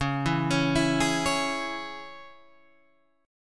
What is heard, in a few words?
C sharp Minor C sharp Guitar Open G, chord; 11,9,11,9,9,11